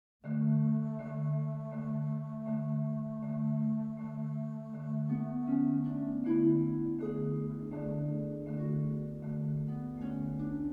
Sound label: World Music